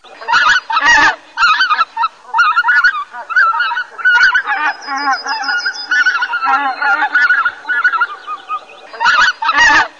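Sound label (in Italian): OCA LOMBARDELLE GRUPPO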